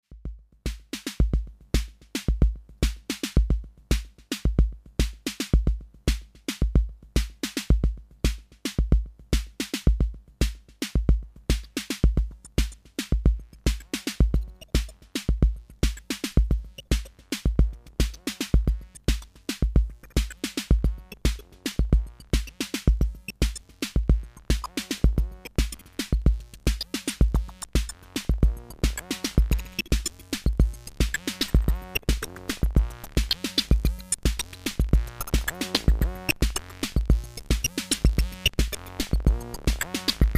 Acid370, you first hear a modded TR606 playing BD and SD; RS370